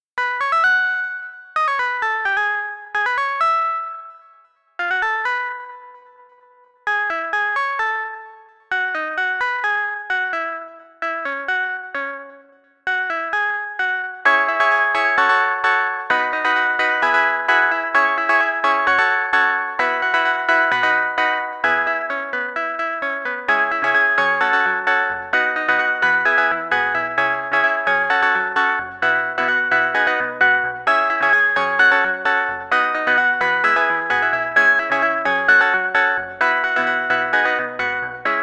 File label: lo-fi keys